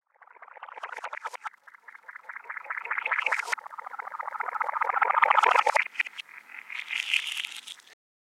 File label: Textures; 99Sounds; The Weird Side Samples